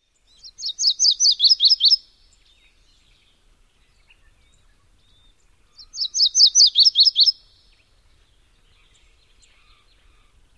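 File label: Virginia's warbler songs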